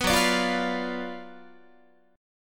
Gb Major 7th Suspended 4th Sharp 5th, down, Guitar